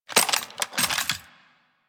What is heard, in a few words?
Rifle Reload; Warframe; Weapons; Thanotech